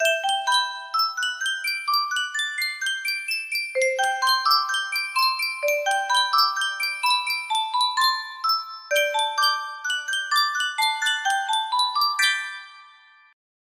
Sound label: Yunsheng custom tune music box; final fantasy xiv